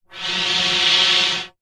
industrial plastic tube pipe flexi blow air into

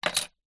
office ruler plastic down on table

